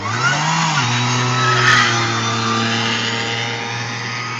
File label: Burnout